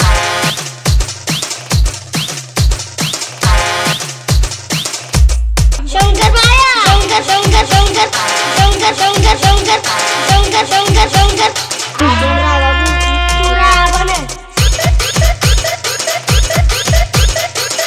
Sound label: Marathi Remix